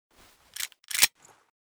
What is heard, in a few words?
pm misfire, weapons